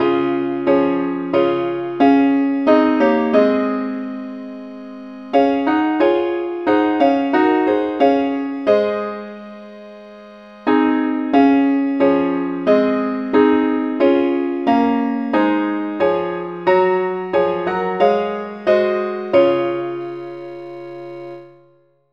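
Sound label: parts only, key C sharp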